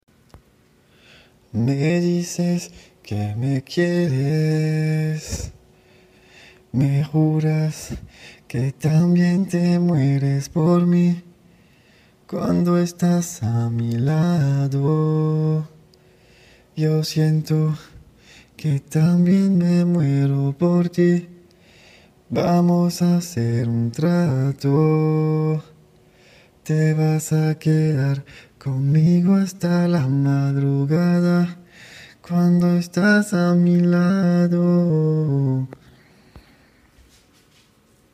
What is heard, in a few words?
reggaeton 120 Fm, Acapellas